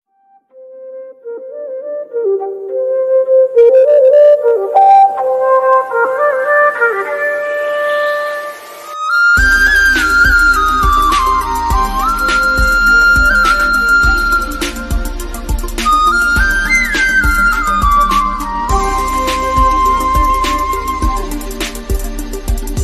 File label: remix ringtone beats flute; minnale; villain; krishana